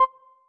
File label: hit